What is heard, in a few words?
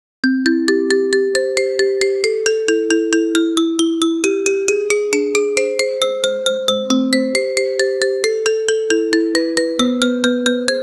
upbeat sound effect xylop; instrument